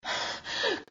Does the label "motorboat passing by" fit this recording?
No